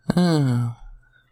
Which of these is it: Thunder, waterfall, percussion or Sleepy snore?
Sleepy snore